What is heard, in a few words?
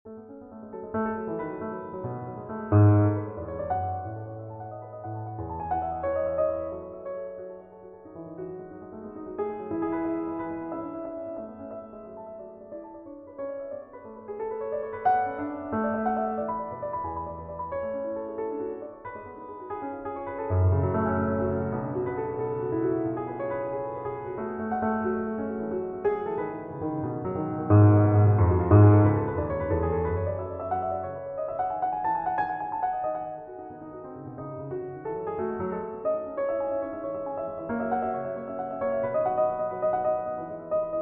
Invention in A Major